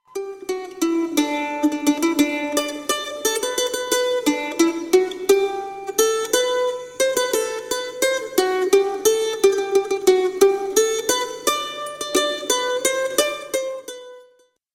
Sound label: Instrument